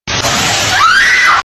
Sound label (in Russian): krik aziatki